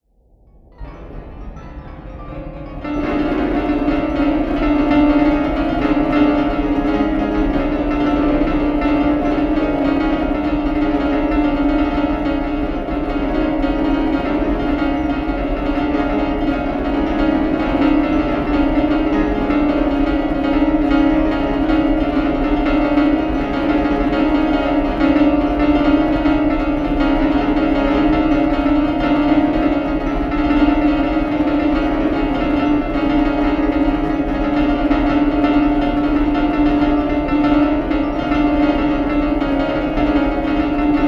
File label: Wailing Wall for player piano ensemble and tape